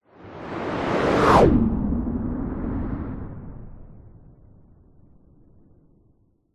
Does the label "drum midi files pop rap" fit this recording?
No